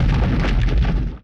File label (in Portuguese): Treme